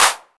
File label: hand clap c sharp key, Royality free clap sound clip tuned to the C# note. Loudest frequency: 4638Hz; percussion; single hits one shots